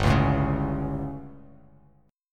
G Suspended 2nd Flat 5th G down Bass Standard, chord; Gsus2b5 strummed; JGuitar